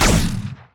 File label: Wallhit Spreadbullet; gamerip